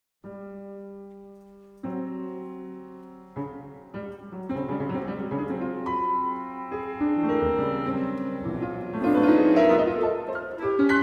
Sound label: piano concerto